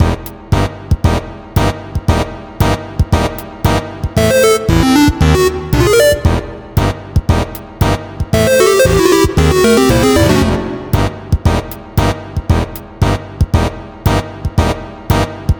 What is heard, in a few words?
F boss 230bpm; super stone legacy